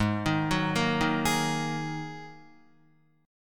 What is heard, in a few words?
Ab 7th Suspended 2nd Ab, Guitar; Ab7sus2 arpeggiated; 3,6,6,3,3,4